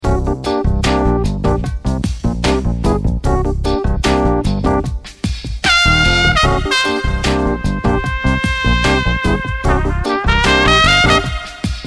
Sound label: Street Funk